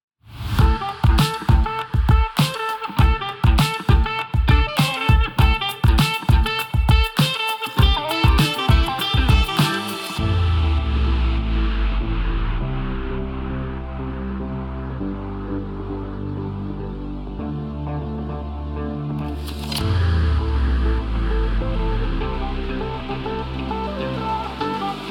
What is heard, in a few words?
Music Voting session; Snaparazzi